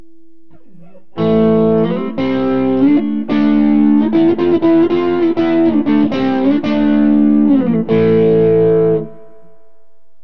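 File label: filmore neck, guitar pickup; burny; fugly clips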